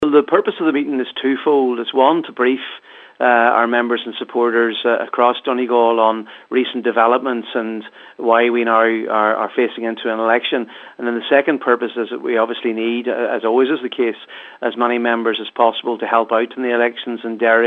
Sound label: pad